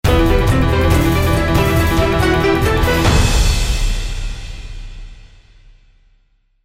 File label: Temple Fight Win; the da vinci code xbox 2006